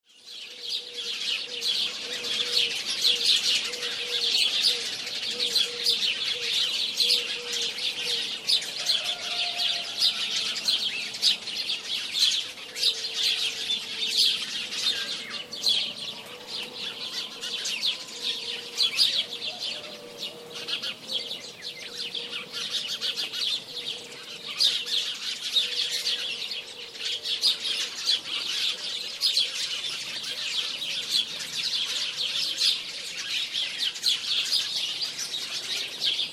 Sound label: birds early morning